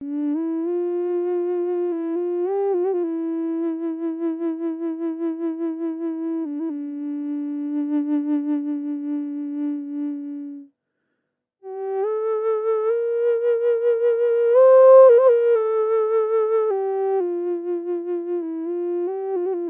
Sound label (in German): Aeolian D ohne Effekte, Native American Drone Flutes; fine woods; Samaru Flutes